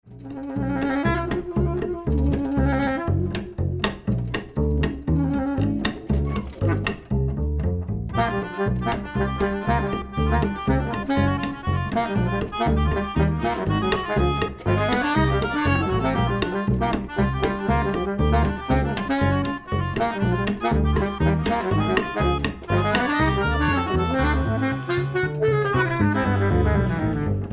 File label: fast fret